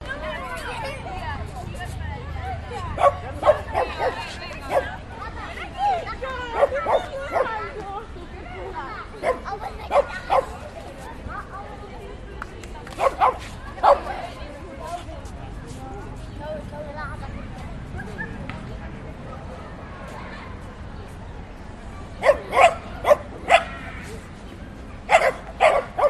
Kids Playing With Dogs Dogs Barking People Chatting Walla; Budapest Hungary